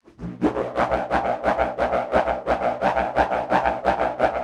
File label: SPIRIT CATCHER